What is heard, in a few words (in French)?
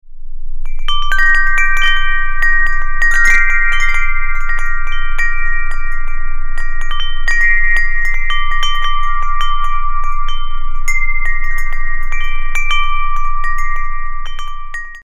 carillon moyen argente; pluton; bleu